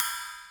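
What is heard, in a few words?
open hi-hat Acoustic Pitched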